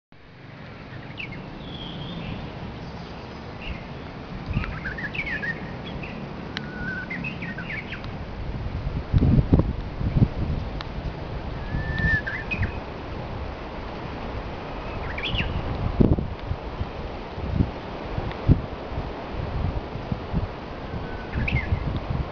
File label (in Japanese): uguisu